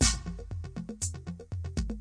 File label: 120 electro blast, drum loops and breaks